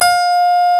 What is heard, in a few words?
CLAVECIN, HARPSICH